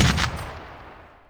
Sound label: 50cal ringout; WAR